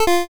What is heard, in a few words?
miss small